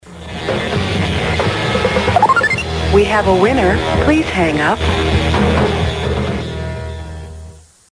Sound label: tone